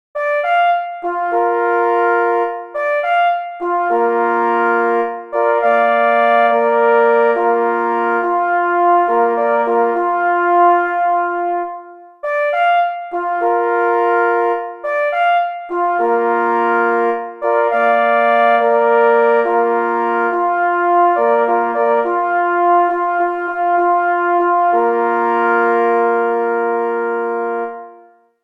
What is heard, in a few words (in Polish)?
Hejnal na 3 plesy; K.E. Kroskowski; Hejnal KL ZURAW w Koscierzynie